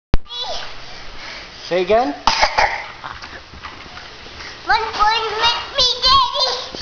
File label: Boing